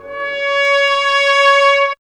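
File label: trumpet tone; E-Lab; X Static Goldmine 1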